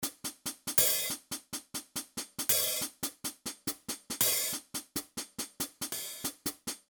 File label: hats edited